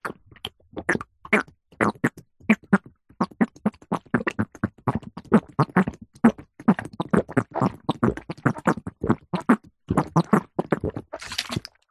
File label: human drink water from large plastic bottle glugs fast